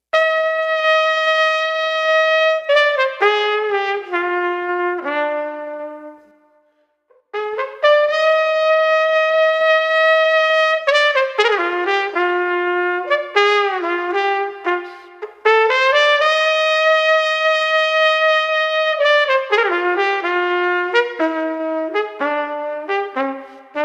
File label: Street Trumpet Blues Cruise 115